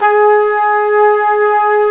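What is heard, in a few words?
WND, Hybrid Winds, Flute & Flugel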